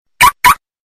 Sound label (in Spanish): Alarma On